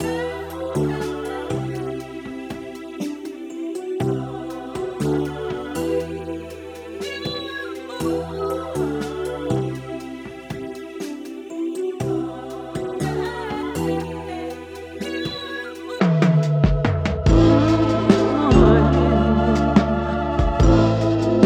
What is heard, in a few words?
Soul Tapes Sample, royalty free loops; inspired by the sounds of 70s soul and funk